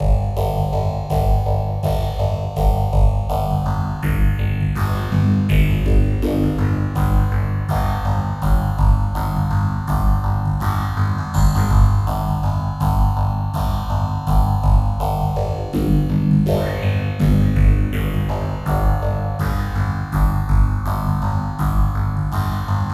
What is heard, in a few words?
Robo Beat, Percussion